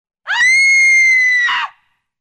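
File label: very scary sounds and screams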